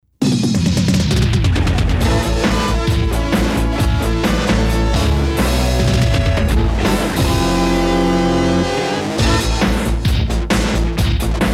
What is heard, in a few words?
JINGLE Fast Donut